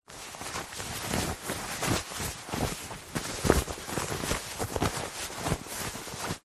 foley clothing jeans denim pants trousers handling movements rustle